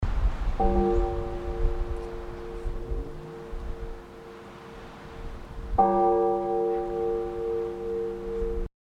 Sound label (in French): cloche; Belcaire